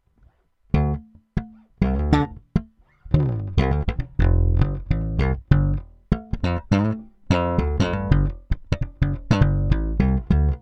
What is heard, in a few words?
Both Slap Tone UP, peavey; peavey shootout audio samples